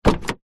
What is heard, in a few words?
car door open ext; vehicles